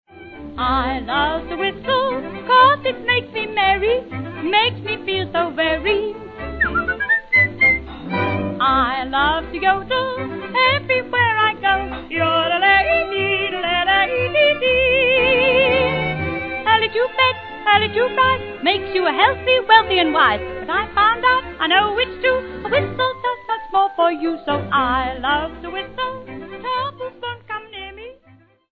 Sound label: i love to whistle